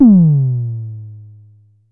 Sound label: Drum X Tom, Pearl Drum-X